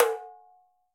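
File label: Tamborim B Open; Latin Percussion; Drums; Drum Hits; Tambourin; Ableton; Factory Packs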